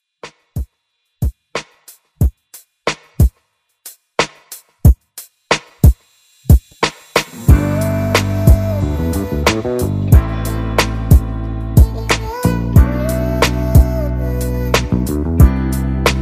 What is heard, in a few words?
Xmas Redrum